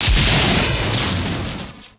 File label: shield impact